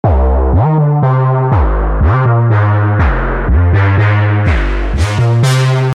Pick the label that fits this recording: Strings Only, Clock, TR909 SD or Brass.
Brass